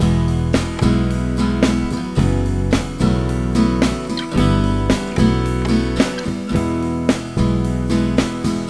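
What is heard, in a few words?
Loop, synth fx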